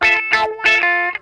SHRILL WAH, Funk Guitar; Zero G - Funk Guitar

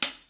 Closed Hat Real Dark; Lost Tapes From Mars

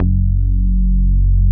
SH 101 BASS; BASS SOUNDS

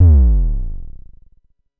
DSM2 Sub; MPC DSM-2

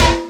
Sample, Roland MV8800, BREAKWILDKIT

